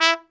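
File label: BRA TRUMP, trumpet, brass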